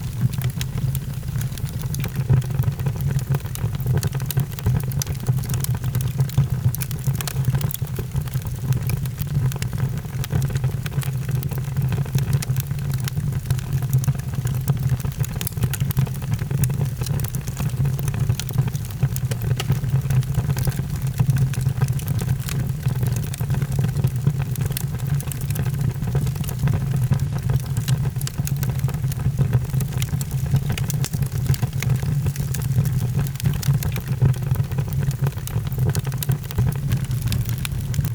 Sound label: fire; Inquisitor 2